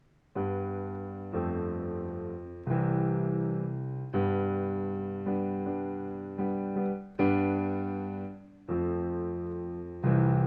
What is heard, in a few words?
Song Instrumental